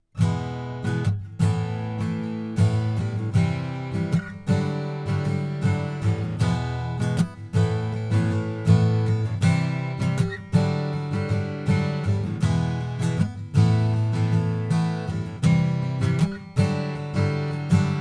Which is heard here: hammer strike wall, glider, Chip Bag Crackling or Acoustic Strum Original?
Acoustic Strum Original